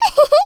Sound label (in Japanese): んふふんっ, Voice; Voice&Breath; Vocaloid 6.5.1; Otomachi; VOCALOID6 AI OtomachiUna Spicy